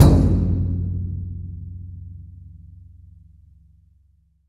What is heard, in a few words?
KODO, JAPANESE